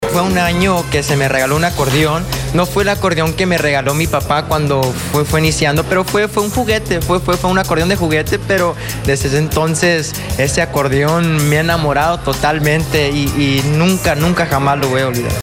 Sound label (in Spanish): Navidad Acordeon